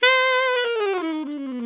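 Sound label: ALTO long fall C 4, Saxophones; Giga Samples Collection; ALTO STEREO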